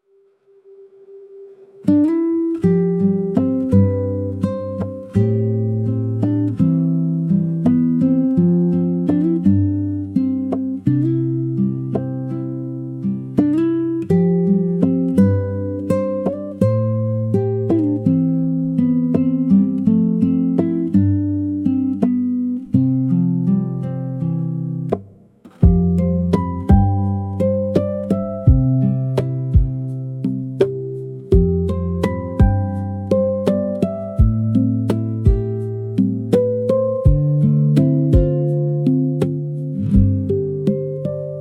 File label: campfire cedar night